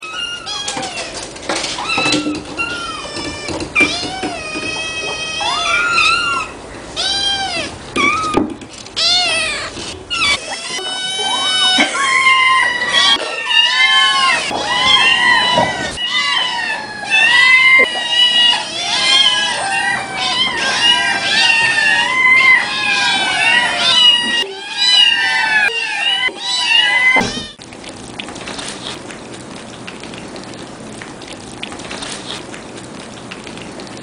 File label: Crying Kitties